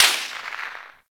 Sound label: Bullet Whizzby; Warframe; Weapons